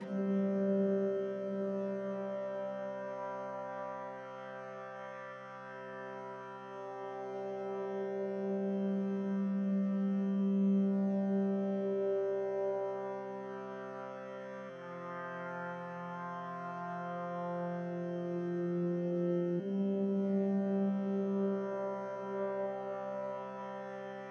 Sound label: pendulum loop